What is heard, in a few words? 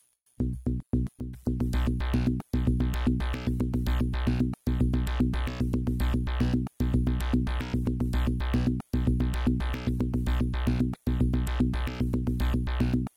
Beat Ambience; Streets of Rage 3; Genesis